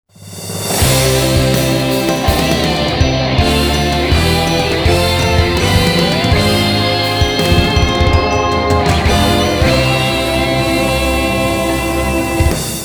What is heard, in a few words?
fanfare; anemoi